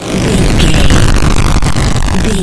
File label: retro spaceship engine